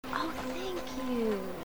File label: Close prog; Kelly Hu